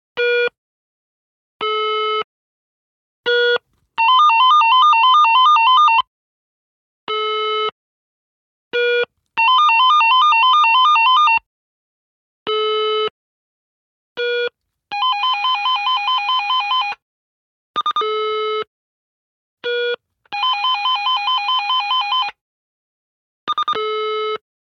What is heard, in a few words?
Walkie Talkie, AEG Voxtel, Power On, Power Off, Beeps, Series; Sergey Eybog; Handheld Tranceivers